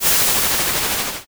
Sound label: grill